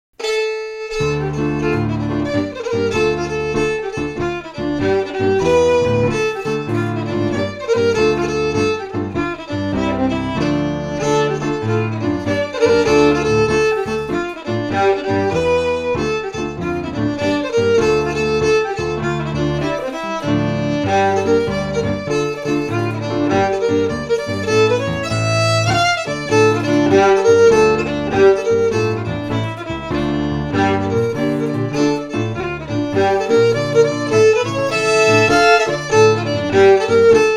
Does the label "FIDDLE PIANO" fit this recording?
Yes